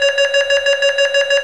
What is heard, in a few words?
enemy lock on; Gunlok